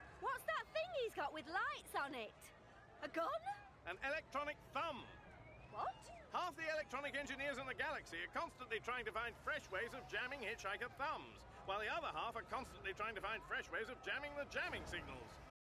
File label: jamming